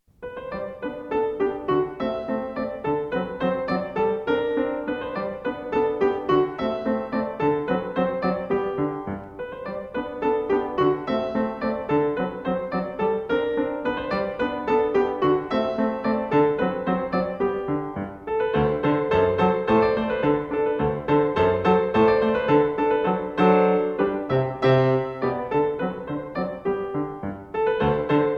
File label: Ecossaise In G Major; Magrath Book 03